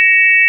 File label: sine; 音の波形と分析